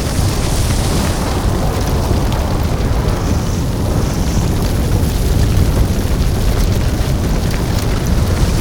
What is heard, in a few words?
skill volcano loop